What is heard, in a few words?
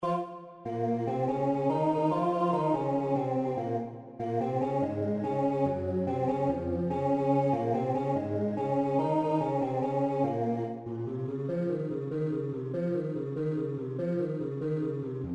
SATB